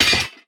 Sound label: wep ground